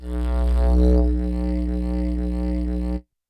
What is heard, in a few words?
Digeridoo Long; YAMAHA RM1X